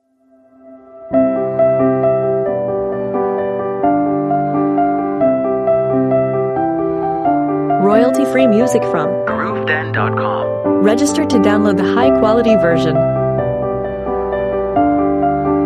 Very beautiful and pleasant melody on the piano. Soft violins support the main melody; set a very tender mood.Great for advertising, Valentine'